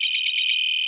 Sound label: CARD